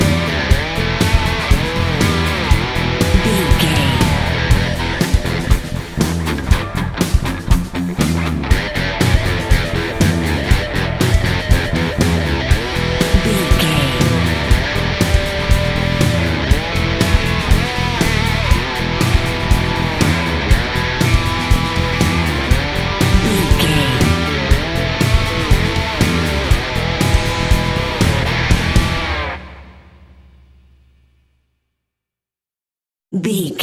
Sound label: bring pain, Sports Rock, Hard Rock, Metal; Pop Rock; NFL; Football; Sports Music; Driving; Bikers